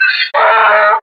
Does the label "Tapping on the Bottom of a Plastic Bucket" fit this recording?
No